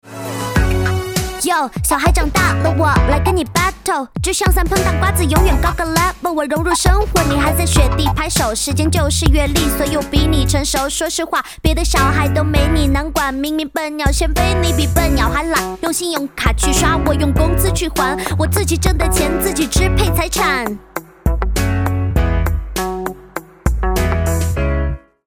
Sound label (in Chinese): 女声 rap 翻唱